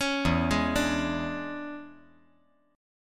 E 13th

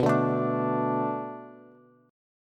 B Minor 7th B Bass, chord; Bm7; strummed; JGuitar

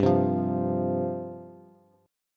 G 7th Suspended 4th G down Bass; G7sus4 strummed

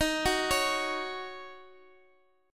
D sharp Minor 7th D sharp, down, Guitar, DADGAD

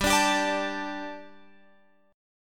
G Suspended 2nd G, down, Guitar, Open F; Gsus2; strummed

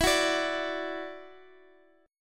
E 7th Suspended 2nd Guitar DADGAD; E7sus2; strummed; x,x,x,9,9,12

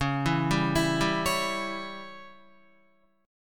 Db Diminished Db down Guitar; arpeggiated; JGuitar

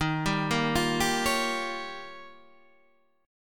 D sharp 9th D sharp, Guitar Standard; D#9 arpeggiated; JGuitar

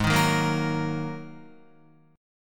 G sharp 7th Suspended 2nd, Guitar; strummed; chord; 3,6,3,3,6,6

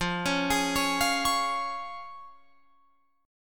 F Minor Sharp 5th, Guitar Open F, chord; Fm#5 arpeggiated; JGuitar

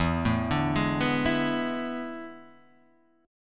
A Major 7th Suspended 2nd Suspended 4th

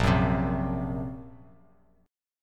Bb Suspended 2nd Sharp 5th, Bass Standard; Bbsus2#5; strummed; 6,9,10,x; JGuitar

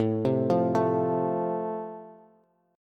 A Minor Sharp 5th A, Bass; Am#5 arpeggiated; 17,15,15,14; JGuitar

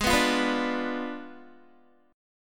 Ab Diminished 7th Ab Guitar 4E,4A,5D,5G,5C,6F; strummed; 16,14,15,16,14,x; down

